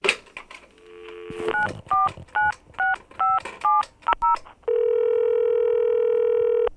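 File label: touch, telephone; Media-Pedia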